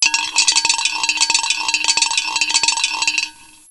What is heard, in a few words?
RATTLE